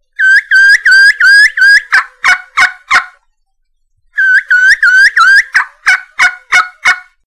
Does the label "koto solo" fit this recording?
No